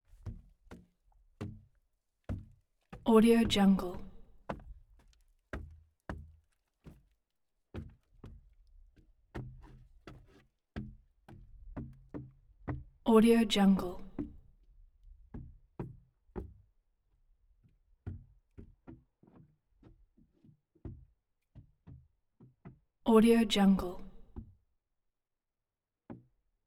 log footsteps

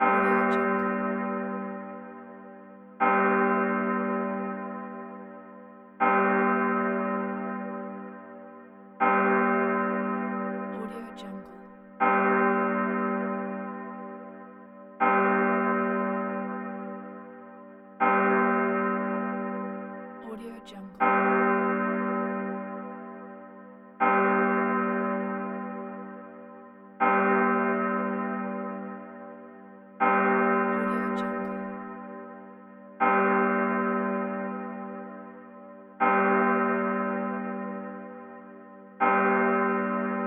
old church bell

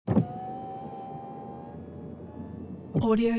transportation sports car int window open distant

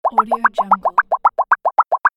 bubbles ticking

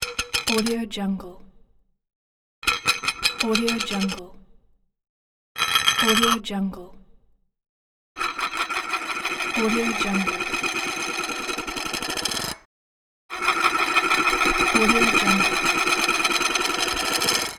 metal cover drop

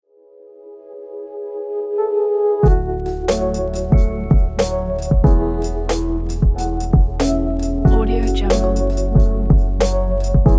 the trip hop

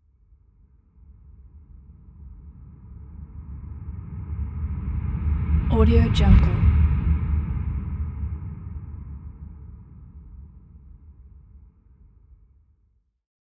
fireball whoosh

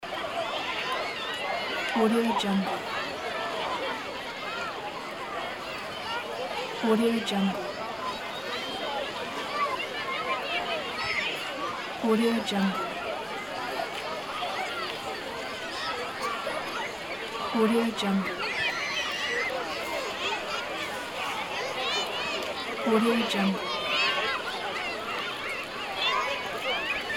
kids playing ambience

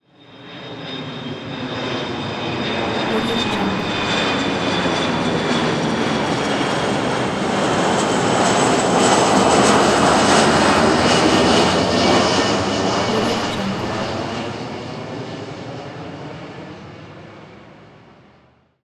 jet pass by; blast drive 30; prosoundfx

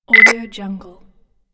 walkie talkie on

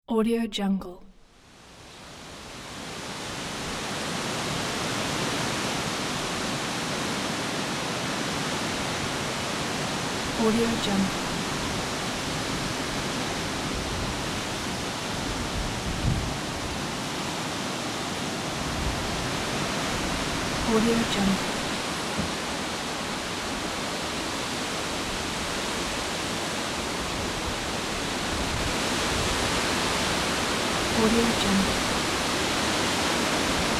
heavy wind in trees

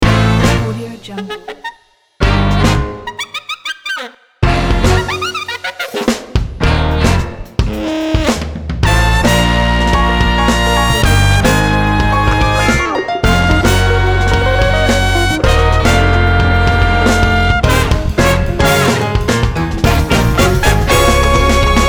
late night talk show ident pack; muratovsky

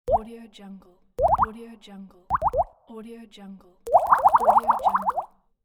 bubbles

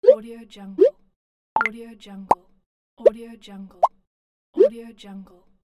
send message

